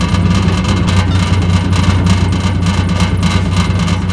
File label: shake; vcmod